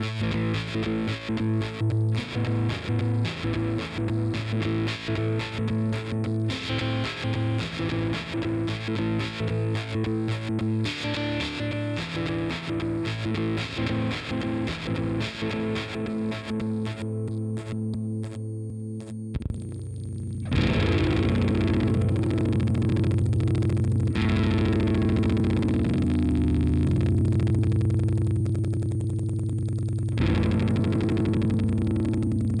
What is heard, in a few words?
4046 m Ring Modulator without VCO; Industrialectric